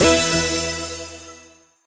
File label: win Small; twin spin